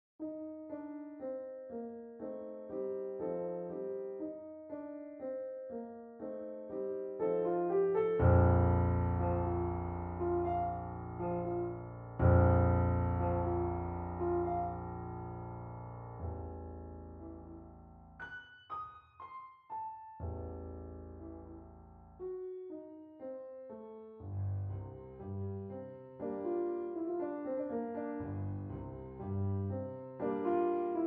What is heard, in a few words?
Glossy Plate Mini Grand; Redline 300